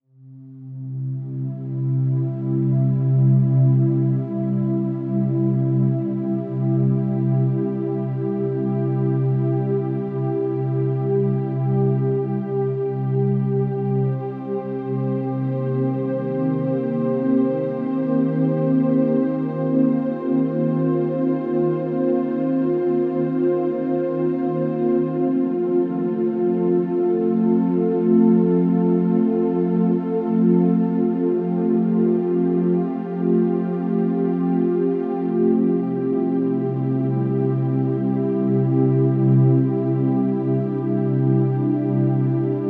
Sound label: Soundscape; Deep Healing Series